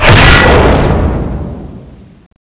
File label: parry attack, weapons; tfa cso; holy sword